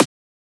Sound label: Transient; FLPRO